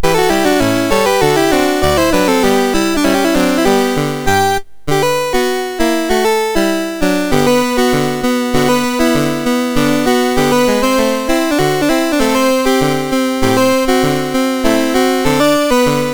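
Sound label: MOS 6581 SID chip; MOS6581R3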